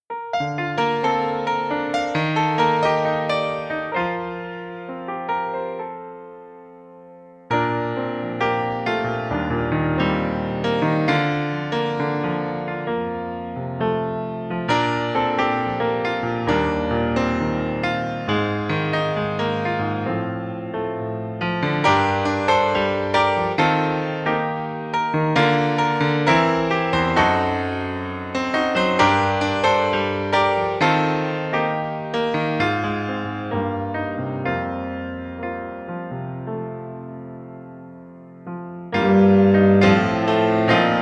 Instrumental Sound Clip; memorial day tribute

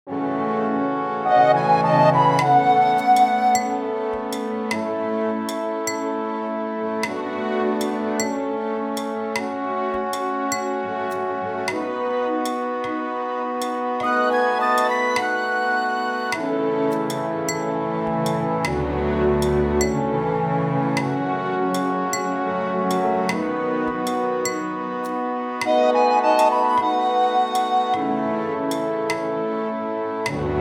instru, A Halloween song; spooktacular

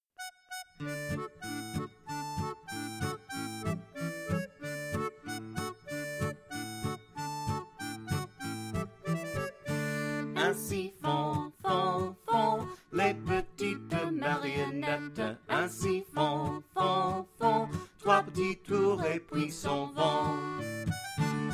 les petites marionettes vocal; French

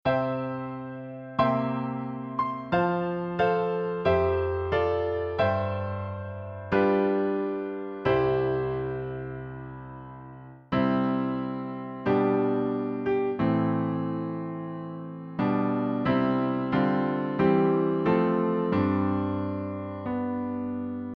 o canada instr, Children's Music

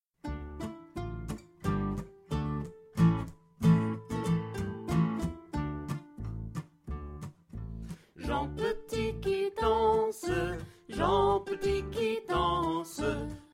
jean petit qui danse vocal; French

